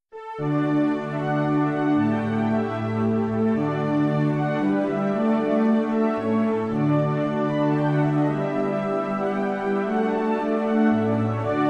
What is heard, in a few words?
Anthem Orchestra